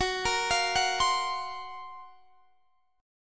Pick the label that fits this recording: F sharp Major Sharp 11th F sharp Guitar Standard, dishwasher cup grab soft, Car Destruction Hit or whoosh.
F sharp Major Sharp 11th F sharp Guitar Standard